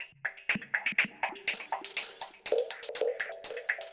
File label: effect percussion minimal saw Gm; percussion loops